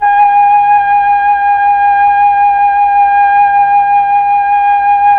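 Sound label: Mellotron Flute G#5; Tron Flutes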